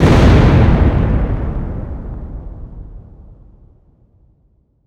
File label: Explosion Fireball Blast; Fire and Explosions